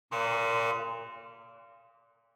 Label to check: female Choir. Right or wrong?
Wrong